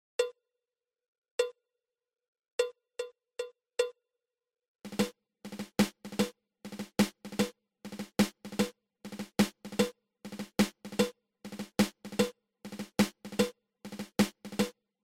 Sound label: Double Drag Tap